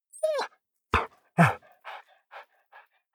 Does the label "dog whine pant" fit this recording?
Yes